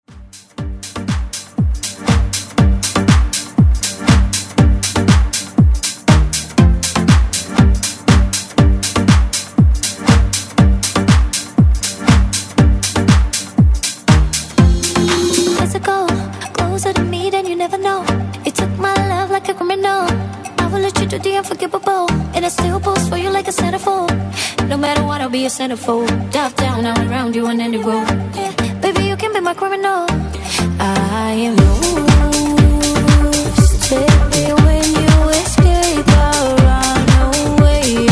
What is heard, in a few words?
Dance Ext Redrum